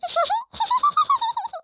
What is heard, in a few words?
giggle